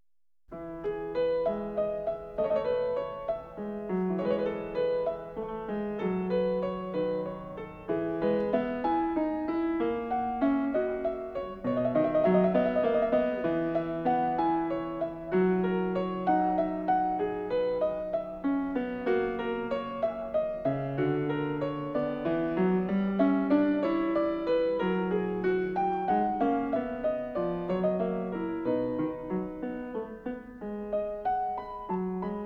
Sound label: Prelude No. 9 in E major, Bach, Well-Tempered Clavier; BWV854